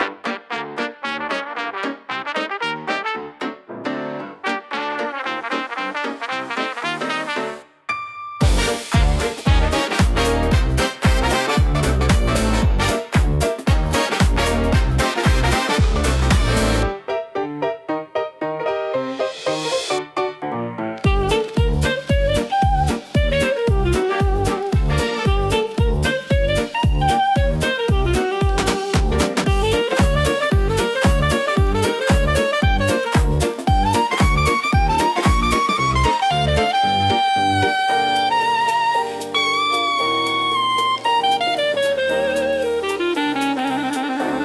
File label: Swing